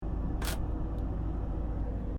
AIROPLANE BOEING 767 CRUISING HEIGHT INTERIOR TOILET DOOR LATCH; Airliner interior recording; Airplanes